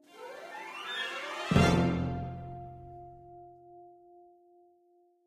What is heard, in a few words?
music restart; Dorothy And The Wizard Of Oz - Cookie Magic